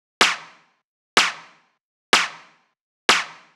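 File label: Snare Loop, 125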